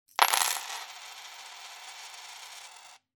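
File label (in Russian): средние монеты крутятся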